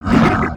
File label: Thwok grunt; Summoner